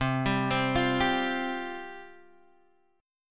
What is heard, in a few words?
C Suspended 4th C Guitar Drop D; down; chord shape